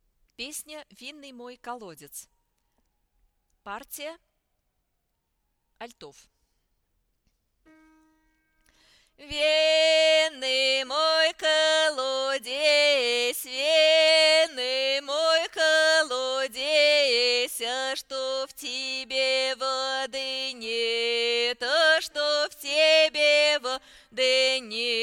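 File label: vinn alto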